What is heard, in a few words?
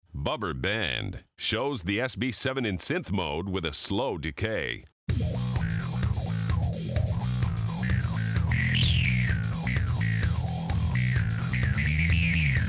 Bubber Band